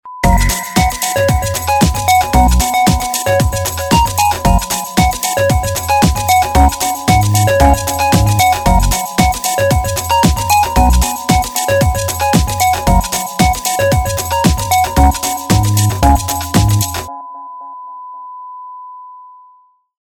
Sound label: air groove